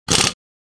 Impact wrench single burst

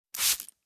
Spray from a glass spray bottle

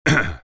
Male throat clearing

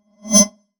Futuristic whoosh transition

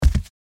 Flip flops single step on wooden floor

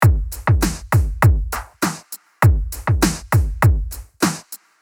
E-Drum loop

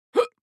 Man having big hiccup

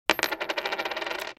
Dropping a coin on a table

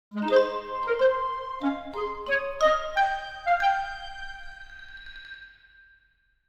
Video game success victory win tropical

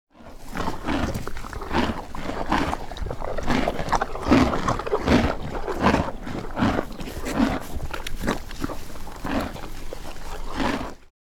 Donkeys munching straw

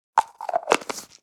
Plastic pill bottle twist cap closing

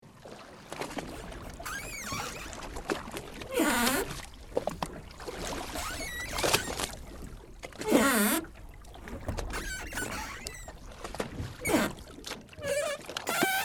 Old crusty canoe rowing creaking and squeaking; boat

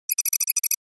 Futuristic data

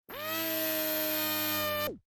Robot arm moving

